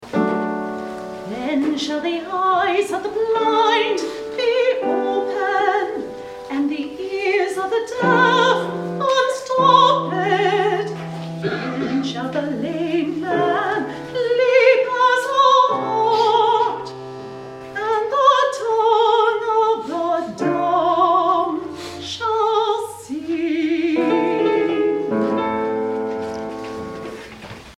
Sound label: alto; Messiah; Then shall the eyes